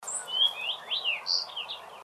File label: fox sparrow; Fox Sparrows around Madison 4/10/2003; (With the noisy Beltline in the background); foxsparrow3